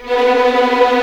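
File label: B3 tremolo, String; East West; The Ultimate String Collection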